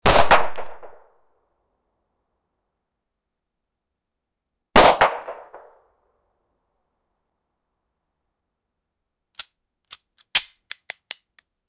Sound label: pistol colt 1911 shot; guns